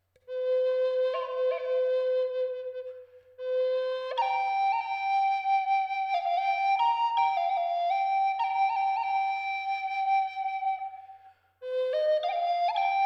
Walnuss Solfeggio 528 Hz

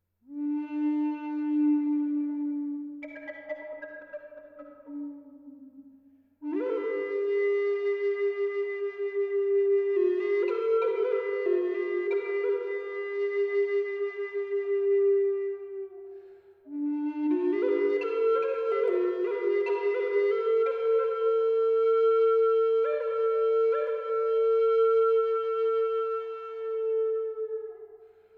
Weißtanne Dis tief 432Hz

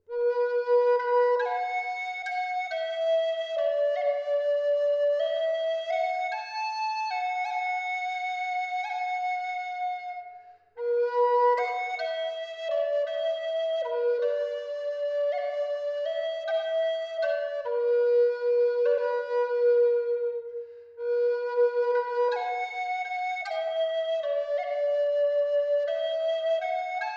Eibe B hoch 432 Hz

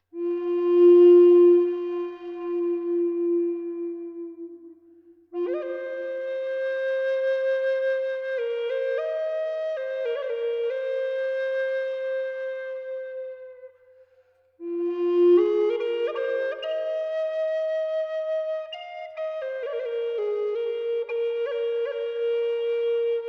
Mammut Fis 432Hz

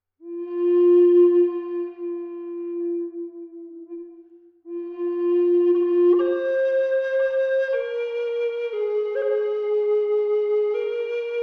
Mammut Fis 432Hz

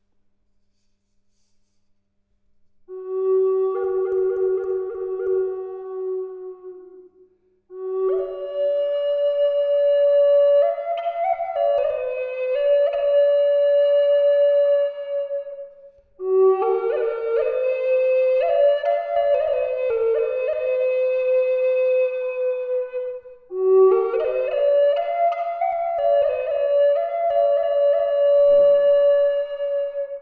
Ahorn G 432Hz

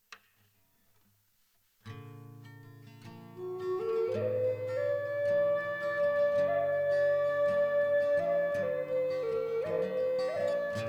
Eibe G 432 Hz Gitarre

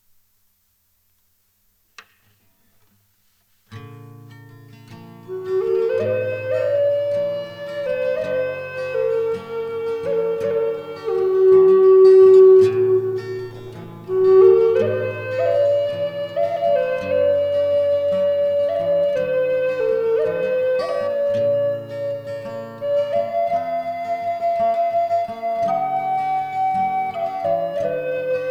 Olive G 432Hz Gitarre

